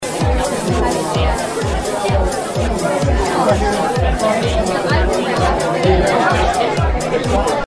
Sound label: party sound